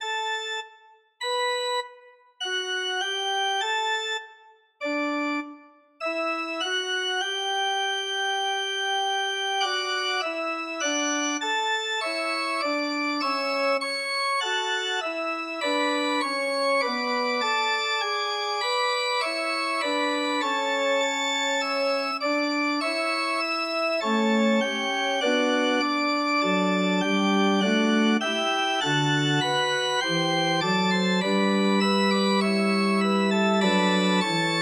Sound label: organ